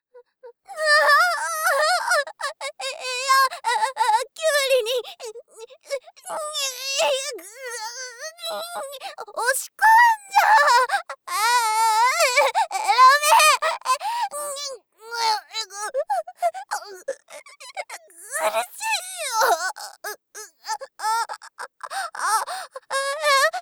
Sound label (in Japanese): 絶叫 ボイスのみ; 3章絶叫キュウリ排出ダイジェスト